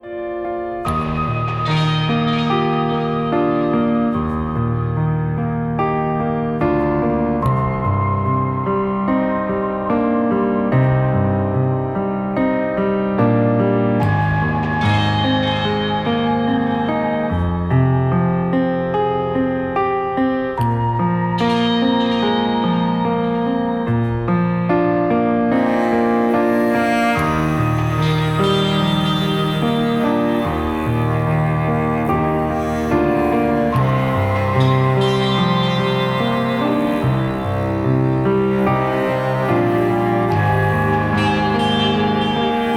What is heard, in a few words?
Instrumental